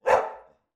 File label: dog labrador single bark internal